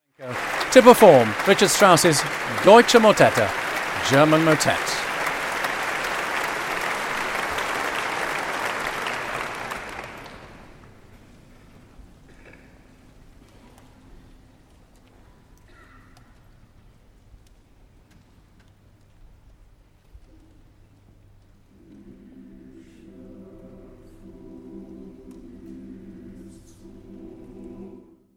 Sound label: deutsche motette for chorus; richard strauss; op 62